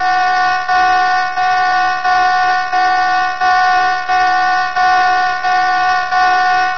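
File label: blob alarm; CHOMPStation2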